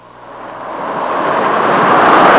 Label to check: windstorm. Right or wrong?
Right